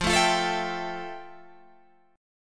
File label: F Major 9th F, Guitar, Open G